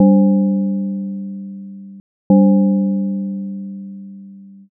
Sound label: gong clock